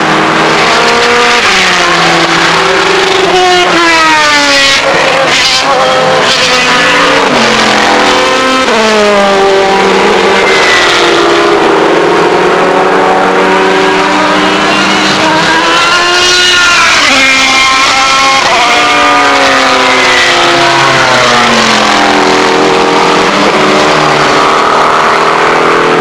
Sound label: Fangio chicane; Sebring 2011